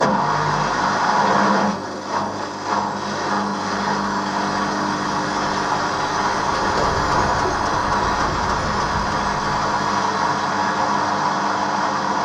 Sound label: Steady State, Washing Machine Wash Spin Cycle